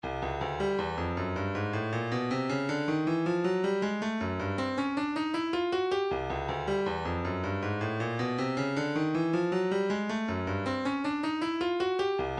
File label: phat march